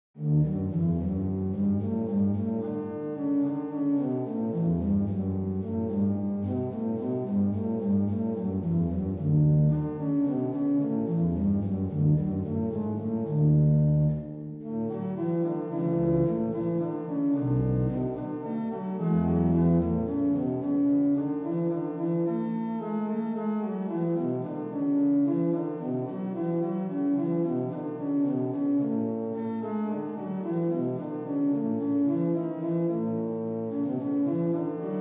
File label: pedal solo waltz, organ